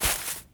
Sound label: Grass